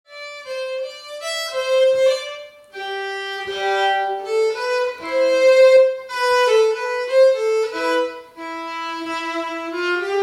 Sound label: Piano